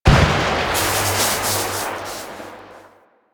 Lightning Machine Strike; Ambience; Props; Warframe; Entrati

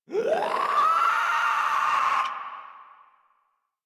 Infested Runner Chasing; Warframe; Runners

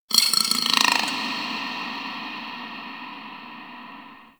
Under City Small Creature

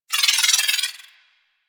Pistol Proj Whiz; weapons; Warframe; Thanotech

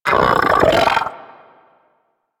Arachnoid Vocal Attack; Warframe; Corpus; Arachnoid Hunger

